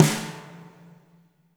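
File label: reverb snare left; Spectrasonics; Burning Grooves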